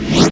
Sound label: Ensoniq EPS Efx